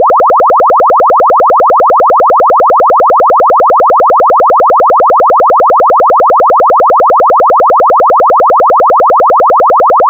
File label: chirp; chirp toys